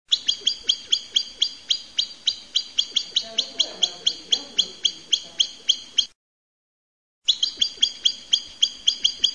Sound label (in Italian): spincione